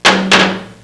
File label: HEAVY ME, metal plate hit